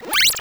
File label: button